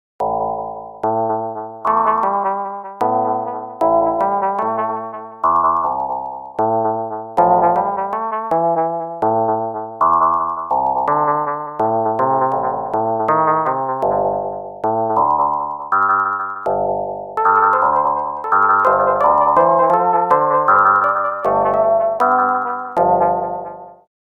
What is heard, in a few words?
old fading keys